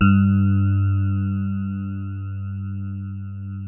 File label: DX Rhodes; Best Service Advanced Media Trax Modern Composer